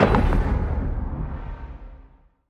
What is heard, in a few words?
spin Button Click; mercy of the gods